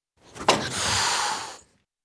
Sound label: Tom Cat, Attacking Strike And His; Cats; BBC Sound Effects Library